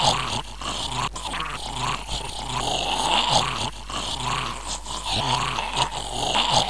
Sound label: fast zombie attack; escape series